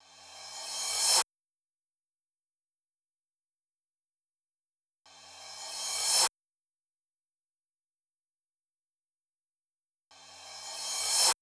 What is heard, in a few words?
Open Hat